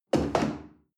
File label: chair wood place hard